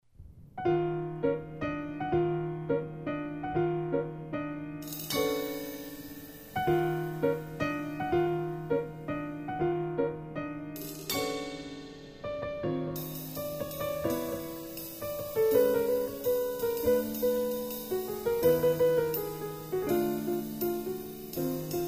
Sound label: Piano and Drum